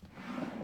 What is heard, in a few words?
open panel, exchanger